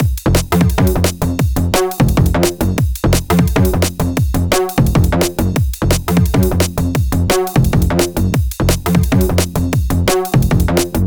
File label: pan the tops only